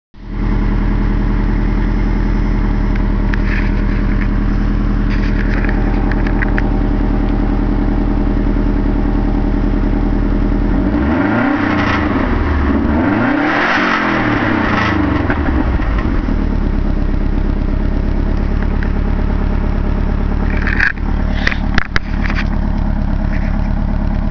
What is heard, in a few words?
subaru old sound, SUBARU IMPREZA: ORIGINAL auspuh